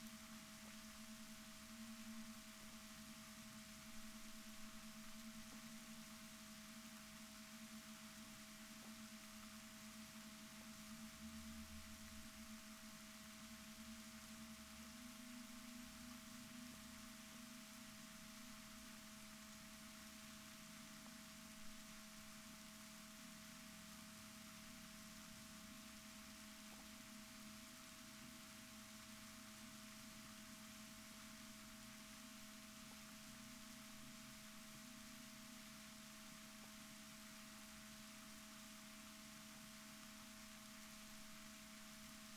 rorquals negatif, Balaenoptera Physalus Chile

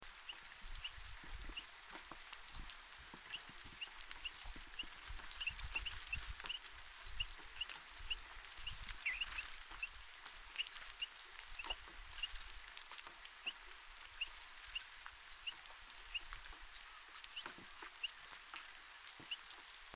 Pyrrhula pyrrhula; SETE

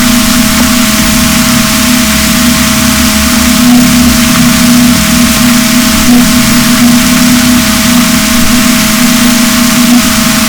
rorquals negatif, gaussian noise; Balaenoptera Physalus; SNR 24dB

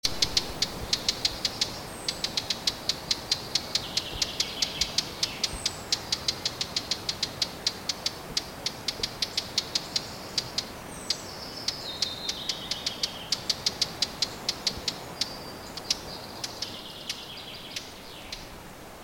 Sylvia atricapilla; SETE

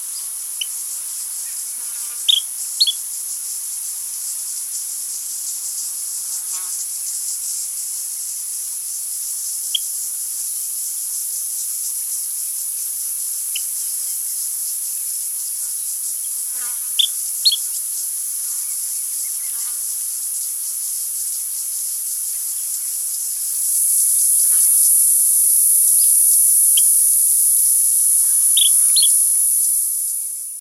bird

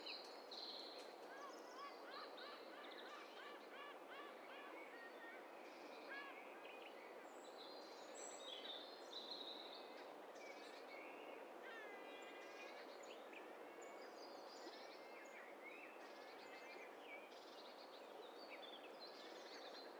STEREO BIRD COCKTAIL PARTY

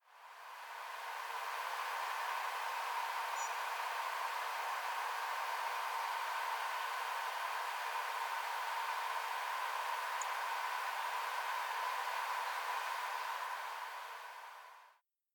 Erithacus rubecula; SETE

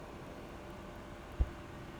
pulses, BALAENOPTERA; MONACO 2022-07

